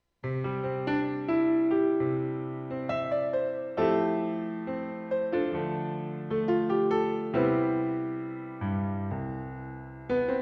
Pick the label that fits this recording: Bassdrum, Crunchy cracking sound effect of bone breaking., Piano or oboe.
Piano